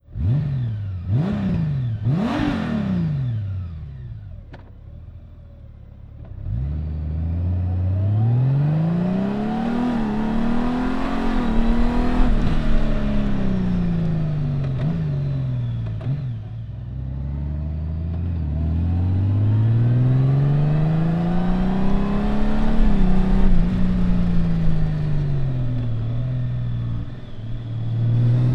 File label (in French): Honda Gold Wing origine; Silencieux d'origine